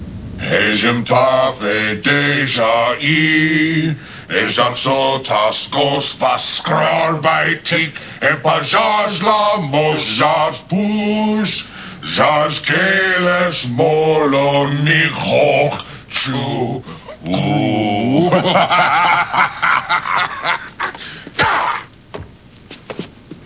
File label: Klingon drinking song; klingon database; worf and huraga